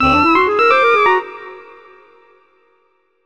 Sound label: Collect Cheato Page